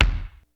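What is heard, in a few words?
kick, Hip Hop DRUM; InVision Interactive; 40 Oz Phat Slammin Hip Hop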